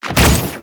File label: Attack